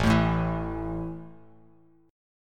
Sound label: A 5th A down Bass Standard; strummed